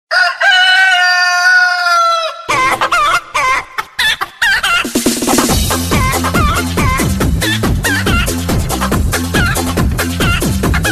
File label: funny crazy chicken